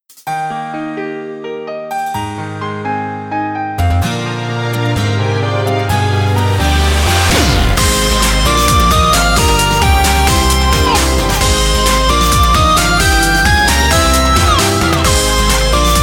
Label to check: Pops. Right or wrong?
Right